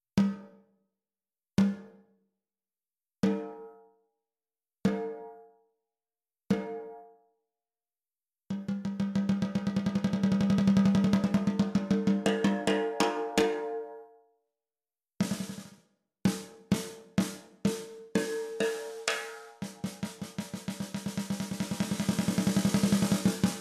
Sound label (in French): TAMBURO ACIER 14X65 MIX, CAISSES CLAIRES